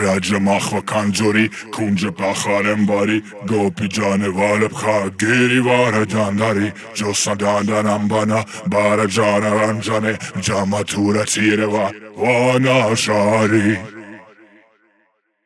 138 Shamanic Voice (Wet), Psytrance

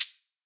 HiHat, Psytrance; Drum Shots

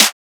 snare; Singomakers Astral Psytrance; One Shots; Snares

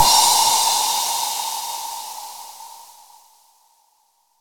Crash, Cymbals; Minimal House

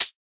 Hihat, Psytrance; One Shots

